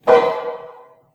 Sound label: piano